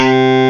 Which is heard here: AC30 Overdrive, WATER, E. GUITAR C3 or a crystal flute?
E. GUITAR C3